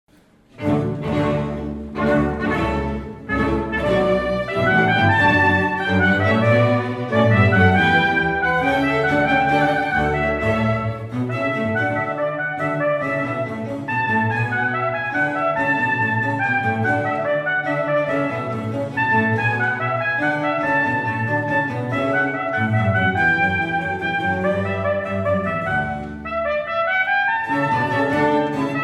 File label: Trumpet Sound; Holiday; Wedding